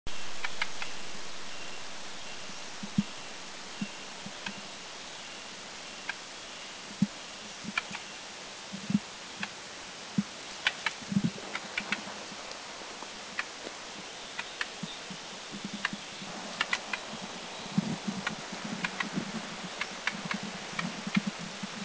Red-flanked Bluetail, Doi Lang; Thailand

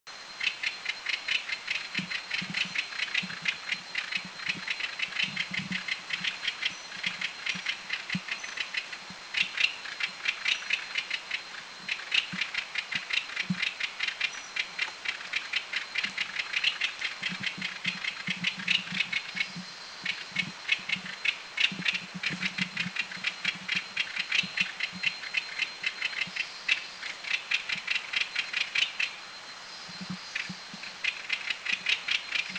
Puff-throated Babbler Chatter, Thailand

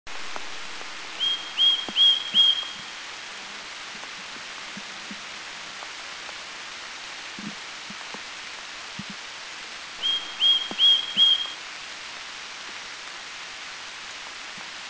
Green-backed Tit

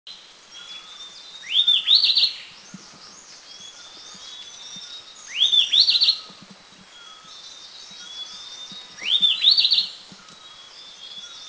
Mishmi Wren Babbler; India

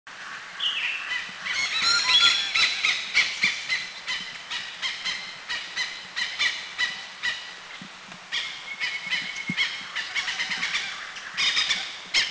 Oriental Pied Hornbill, Thailand

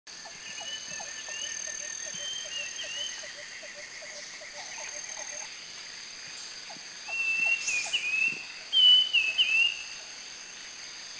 Banded Bay Cuckoo; Thailand; Krung Ching

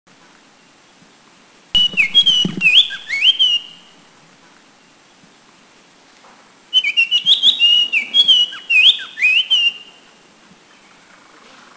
Oriental Magpie Robin

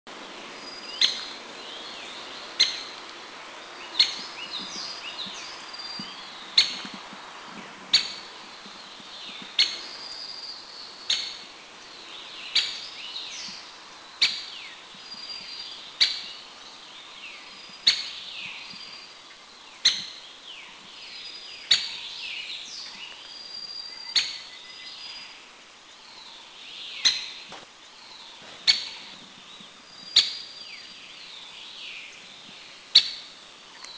White-backed Woodpecker; Dendrocopos leucotos insularis; Anmashan; Taiwan

